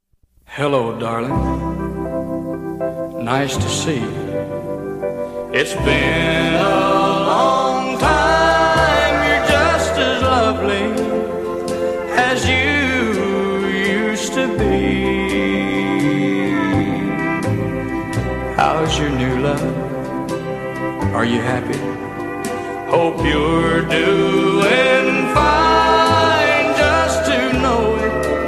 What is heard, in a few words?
english tone ringtone